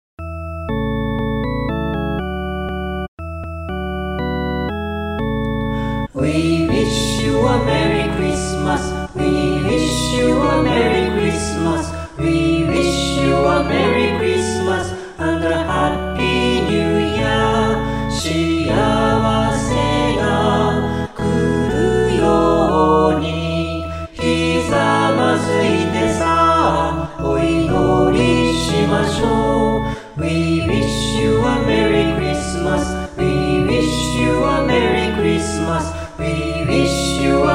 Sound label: We Wish A Merry Christmas chorus